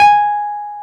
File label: PNO, Wurly